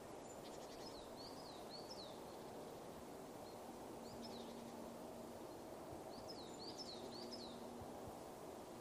Tannemeise

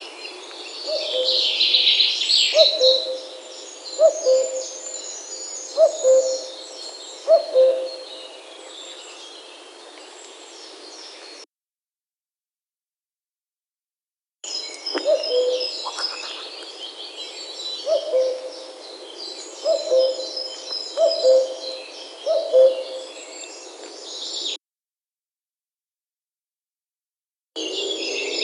Kuckuck; Ornithologie

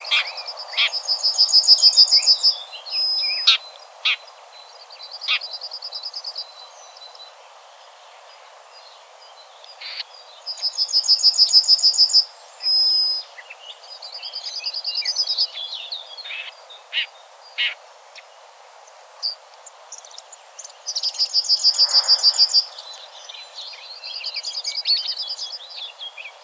Goldammer Neuntoeter; Ornithologie

